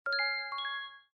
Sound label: bubble